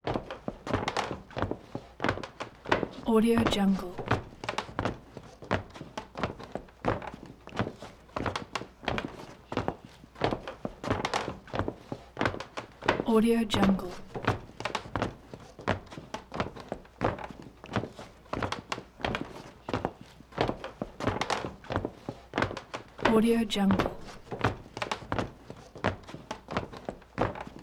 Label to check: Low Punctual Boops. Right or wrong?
Wrong